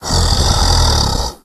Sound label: hulk breathe; Left 4 Dead; Source Engine; tank